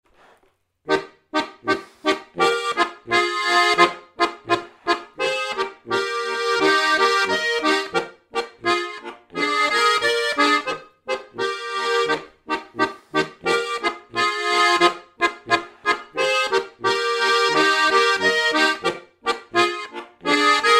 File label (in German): Harmonikapolkerl